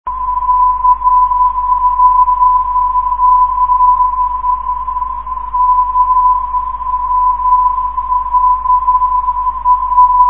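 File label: cml 387khz, non-directional beacon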